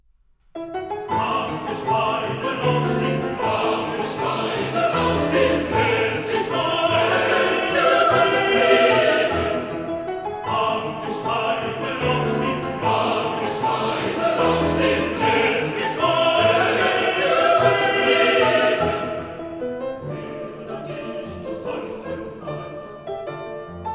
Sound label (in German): Liebeslieder Walzer; Op.52; Am Gesteine rauscht die Flut